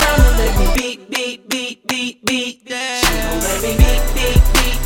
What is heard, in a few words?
Beep